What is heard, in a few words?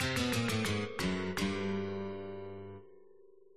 gameover